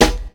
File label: Snare